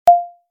HTC 10 Sense 8 Sound Suite Stock Notification; Sprue

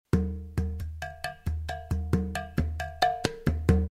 Bongos SMS; Sms Töne; Klingelton

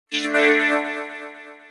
email, Klingelton; Sms Töne

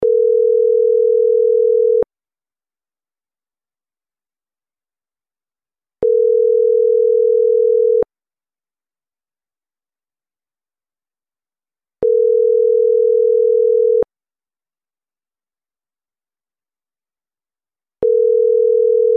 US Ringing tone; Telefon

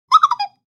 Stock Notification; Pumice; HTC 10 - Sense 8 Sound Suite

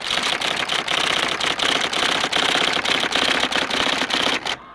iPhone Typewriter

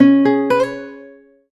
Guitar 2 Stock Notification; HTC Touch Pro2 And Diamond2

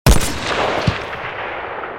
Sniper Rifle

